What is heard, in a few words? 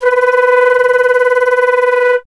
flute B; Miroslav Vitous - Solo Instruments VOL-2; Solo lnst. II